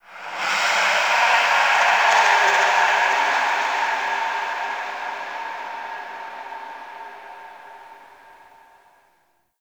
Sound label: Zariman Factory Texture, Ambience; Warframe; The New War